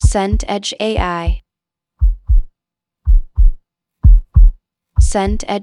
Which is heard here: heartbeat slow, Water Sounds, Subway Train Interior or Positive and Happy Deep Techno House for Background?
heartbeat slow